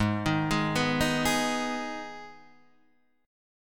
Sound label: Ab Minor Ab down Guitar Half Step Down